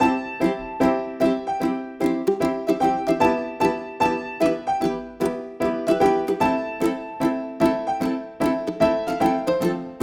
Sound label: Indie Piano